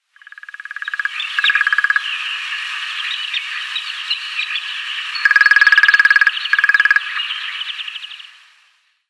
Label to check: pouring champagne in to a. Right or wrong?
Wrong